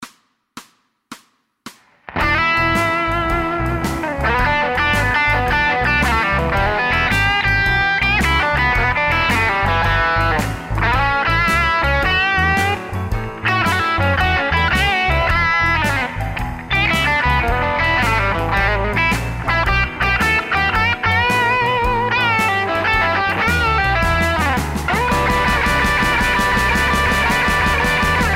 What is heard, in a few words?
Performance Track